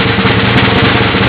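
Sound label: drum rolls; drumrols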